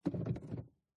Citroen C2 Gear Stick Transmission Manual INT FRONT CENTER; MKH8040; PMSFX